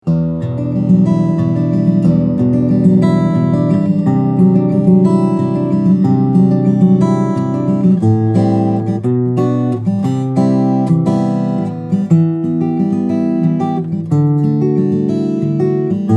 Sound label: Franks OM-D East Indian/Adirondack, steel string guitars flattop; Mike Franks; Franks OM-D East Indian/Adirondack (Red)